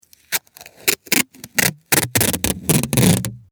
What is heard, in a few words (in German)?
office klebeband